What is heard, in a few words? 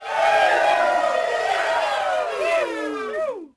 CROWD POS MED; Cars; XBOX